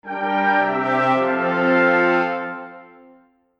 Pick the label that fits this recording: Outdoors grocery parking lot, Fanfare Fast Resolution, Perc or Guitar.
Fanfare Fast Resolution